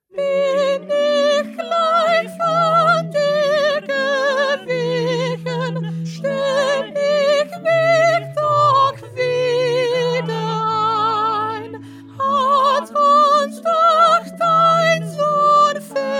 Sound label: Soprano